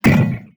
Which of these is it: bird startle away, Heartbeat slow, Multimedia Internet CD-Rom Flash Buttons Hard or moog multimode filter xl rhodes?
Multimedia Internet CD-Rom Flash Buttons Hard